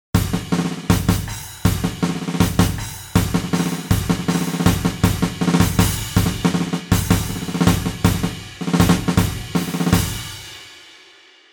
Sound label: BGM Rhythmical; Pokémon X Y; PR Video BGM Rhythmical 1